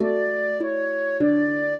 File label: minuet, flute harp; Mozart